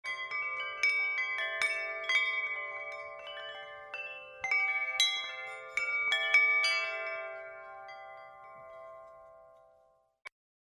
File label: wind chimes ringtone